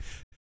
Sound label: BASS BOMB; ILIO; Vocal Planet; Blues & Soul